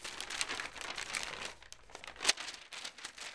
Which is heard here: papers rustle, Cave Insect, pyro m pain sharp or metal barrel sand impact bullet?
papers rustle